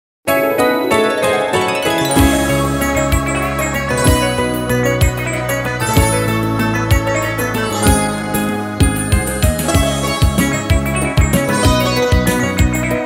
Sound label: Happy children’s song